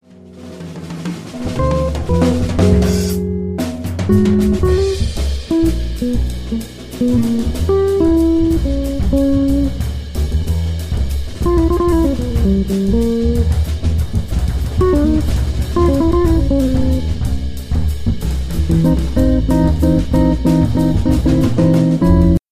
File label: Blues in E; Art of the Blues